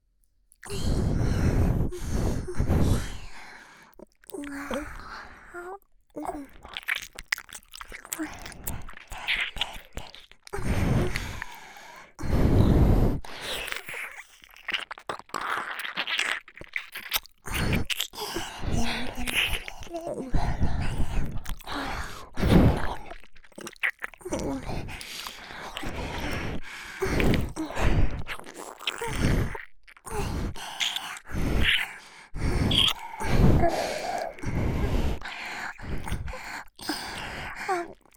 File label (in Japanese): ダブル鼻息耳舐め